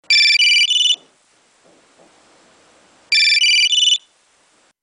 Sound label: nokia trio tune; ringtones